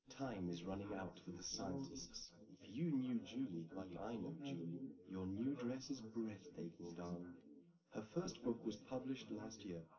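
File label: male, live recording 250ms 1m; SiSEC11; underdetermined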